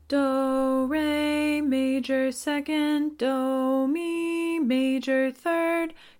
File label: Intervals solfa example